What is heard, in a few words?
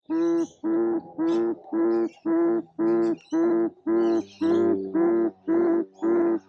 Bizarre bird makes strange noise